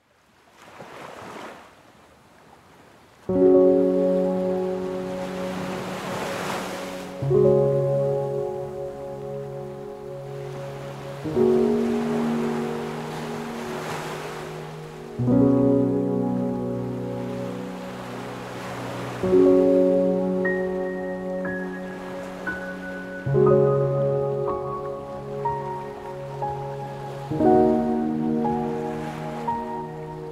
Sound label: piano, rising tide